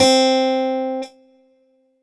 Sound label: Korg M1 Harpsicord C4